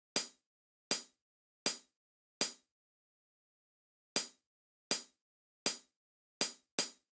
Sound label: chh inner; hydrogen